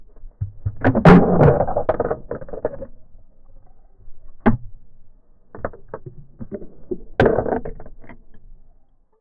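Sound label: submarine hull making creak, underwater; submarine hull making cra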